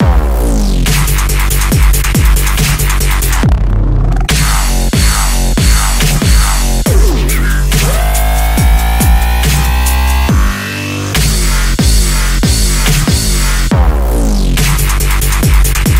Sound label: Deep Step